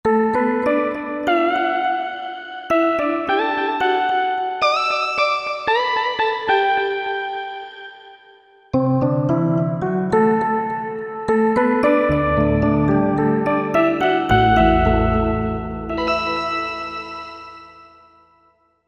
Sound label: Soft Solo